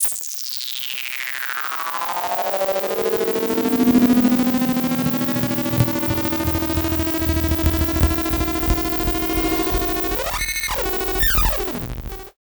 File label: ELECTRIC, Special FX Twisted; USB Soundscan vol.14; AKAI